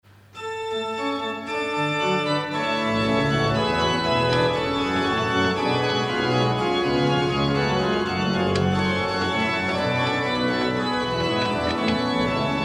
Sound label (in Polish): organy